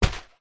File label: pet mouse rodent food bag set down